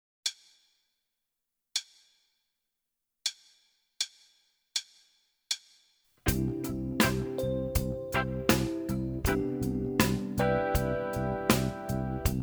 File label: Pattern Rock In 1/8